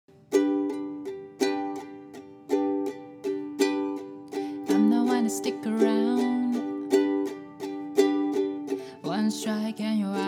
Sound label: Ukulele Cover